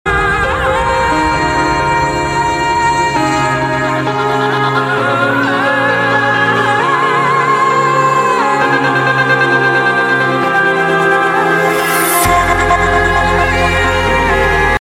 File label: nokia ringtone